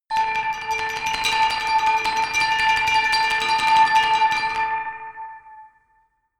glockenspiel dark tremolo low octave

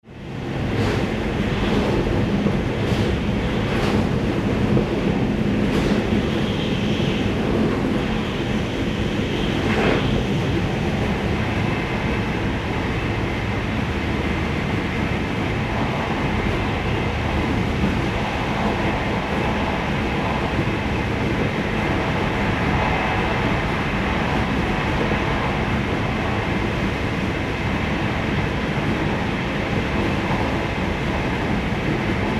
regional train cabin inside

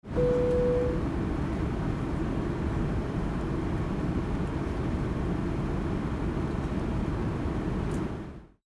airplane cabin inside

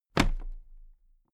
toolbox place upright regular

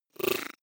data transfer splutter

